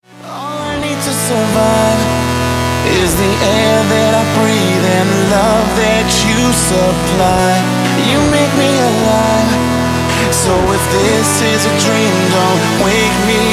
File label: edm; Mixing and Mastering Samples